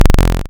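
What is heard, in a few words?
waveform FM sawtooth triangle envelope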